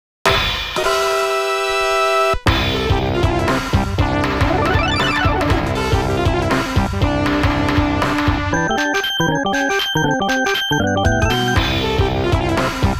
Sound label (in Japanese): ボスBGM -Normal Version-; All Target Cluster